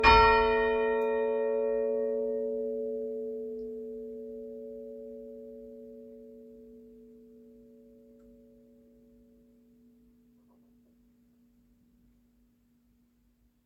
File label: itchingfield five, bells